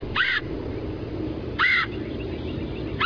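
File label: red-headed woodpecker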